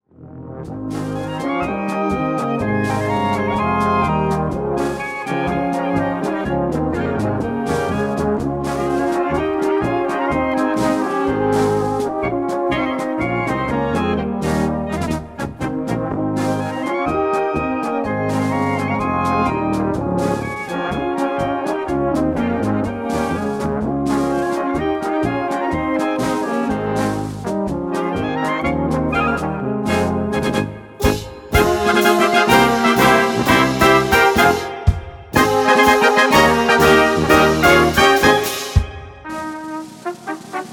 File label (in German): Marsch, Blaskapelle